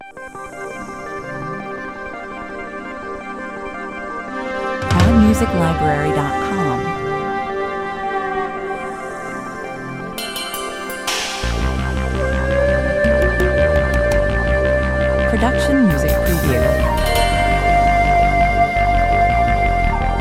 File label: massive intro 84 bpm